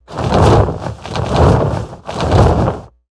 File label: Dragon Wing Flap Large